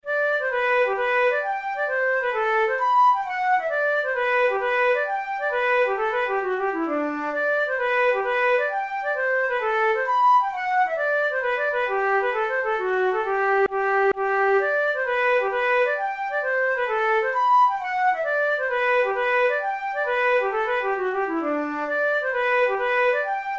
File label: hornpipe, flute